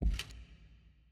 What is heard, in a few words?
reel bounce